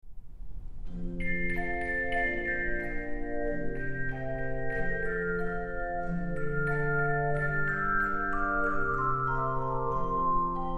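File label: Handbell Music, Handbells 3-5 Octave; Chorister's Guild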